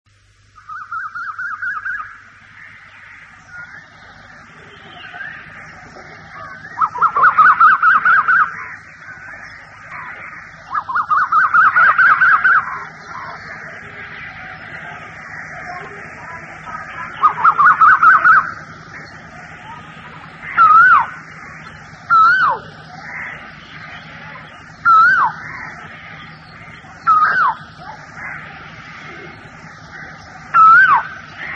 asian koel

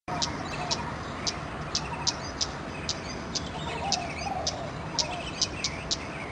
Scarlet-Backed Flowerpecker male calls

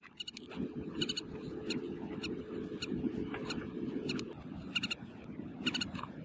Pratincole distress

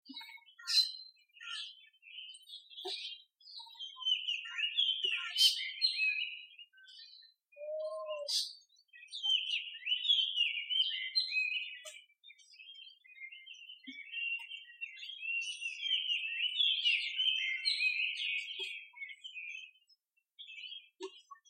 mimic Magpie Robin Call

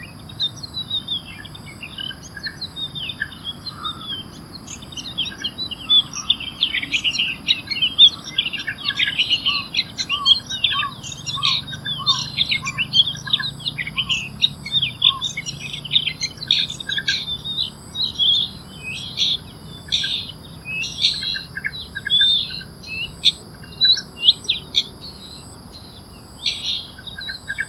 Long-tailed Shrike call; Ipoh Perak Malaysia

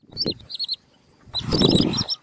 Wood Sandpiper calls, Ulu Dedap Perak Malaysia

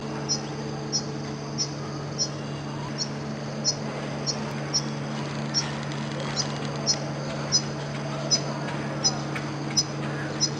Blue Whistling Thrush juvenile call; Amar

